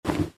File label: flap